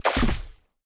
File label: card game ui general thud splash; esmteam